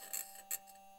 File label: mic, mars2020 edlcam